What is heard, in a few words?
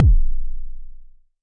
Jomox MBase, drum machine; Jomox MBase 01